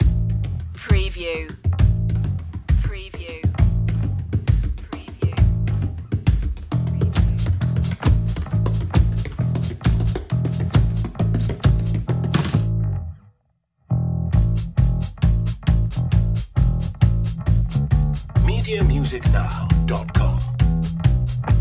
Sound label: old irish tavern drums and bass